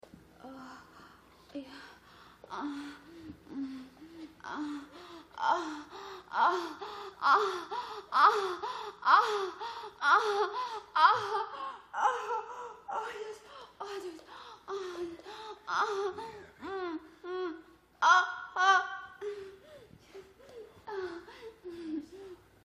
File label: moan